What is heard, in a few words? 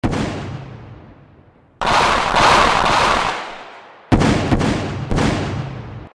Gun Shots